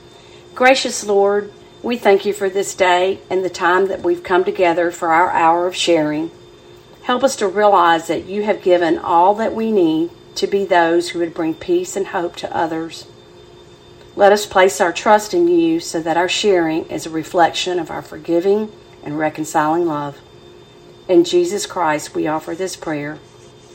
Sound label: Closing Chorus and Benediction